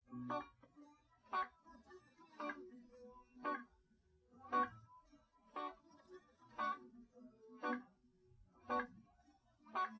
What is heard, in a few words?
no drums, live rec; SiSEC11; underdetermined